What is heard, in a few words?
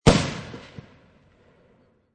M224 60mm Mortar Fire; Guns; VRSonic